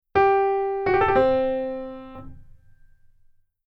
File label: Keyboard Turn